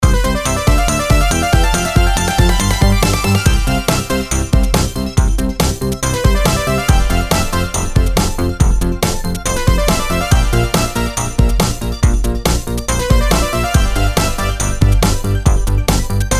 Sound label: Fanfare loop